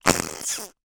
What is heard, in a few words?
cartoon squeeze squeak